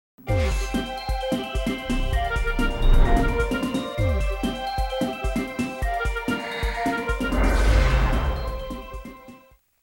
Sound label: End Jingle; Metroid Prime 2